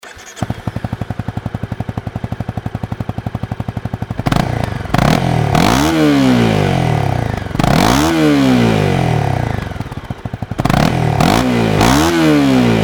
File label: crf250rally